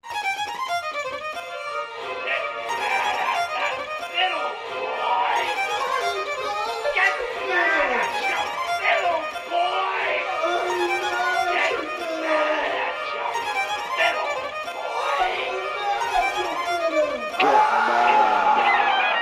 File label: Fiddle set